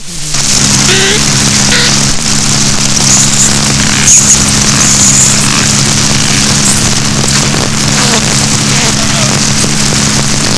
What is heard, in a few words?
G Griseus